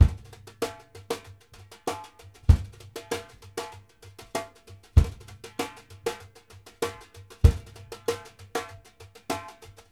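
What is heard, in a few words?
LIGHT TOUCH R, SP BRUSH, Drumworks; Sampleheads - New York City Drumworks VOL-1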